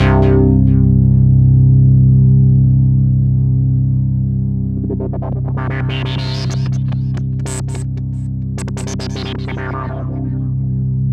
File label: Roland JV 2080, interval; MG INTERVAL